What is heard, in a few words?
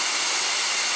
Steam Loop